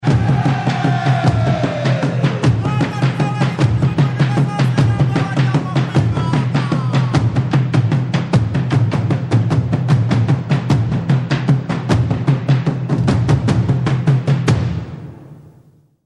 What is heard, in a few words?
Drummers of Burundi